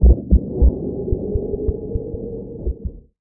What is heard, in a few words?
Haptic Train door open